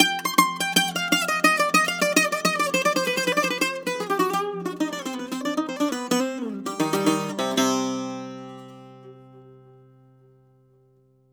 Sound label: BOUZ C-1, bouzou